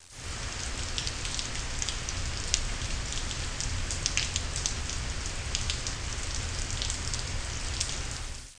REGEN; Kunsthaus Tacheles

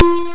PIEPS

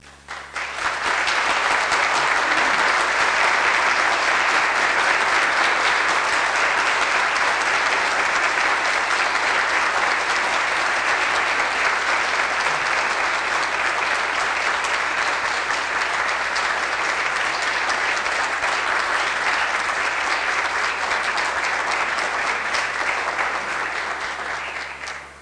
Applaus; WAV Sound Effects

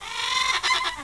huhn; Jump Zampoli

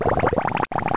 Sound blubber; PARK

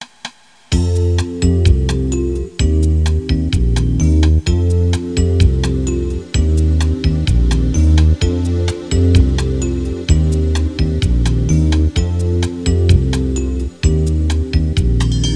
MUSI

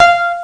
KLAVIER

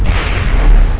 landung; speedy

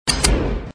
metallisches klicken; MinorityReport

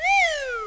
Klopfer Geist Ein

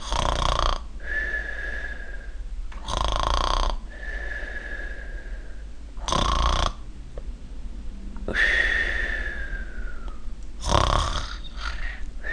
Schnarch

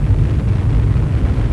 VULKAN

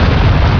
Vulkan; PC Gamer IT CD 48; 1602 AD; 1602ad demo

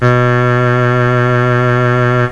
Posaune; DeadEnd 1.1.2

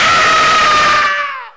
schrei

